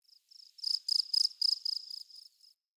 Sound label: Forest Open Glade Cricket Close, Day